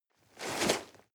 nylon leather medium short

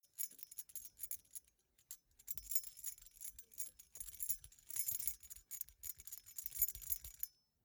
key ring small rattle air sequence soft

